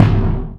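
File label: bass drum, right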